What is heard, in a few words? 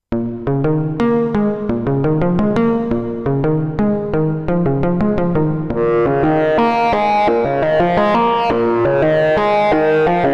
guitar lead; axxim; radias; r3dias